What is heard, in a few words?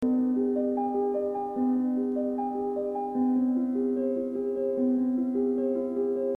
Maj7 Bass; Richter; Prelude Und Fugue 1